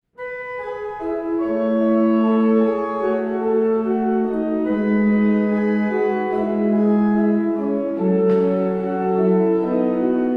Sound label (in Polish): alleluja, chor